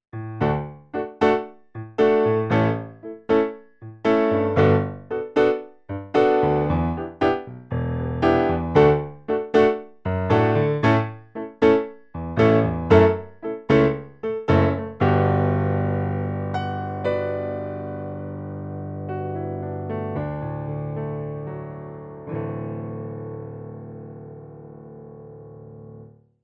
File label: Roland HP702 Bright Piano